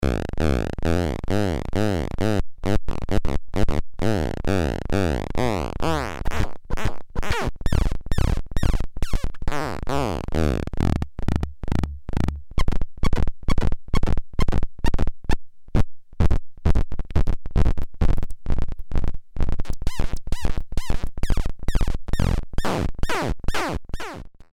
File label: Landscape Noon Larynx